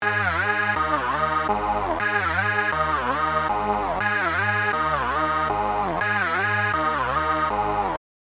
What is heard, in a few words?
Lead